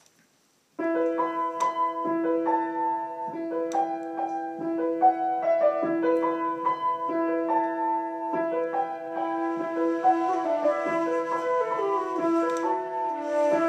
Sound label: Waltz; Mimolette